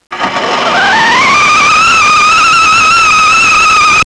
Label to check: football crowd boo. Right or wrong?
Wrong